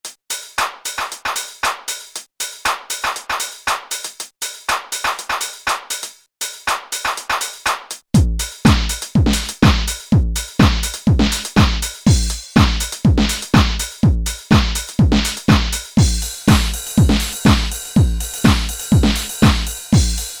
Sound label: Techno Kit, Alesis Z2 EuroDance